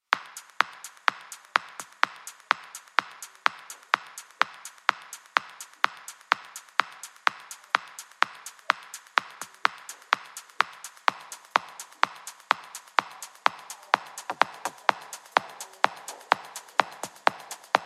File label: melodic house techno electronica; eversummer podcast